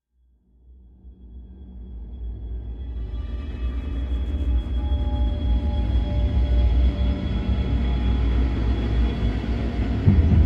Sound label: music danger loop